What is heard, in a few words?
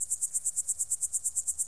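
cricket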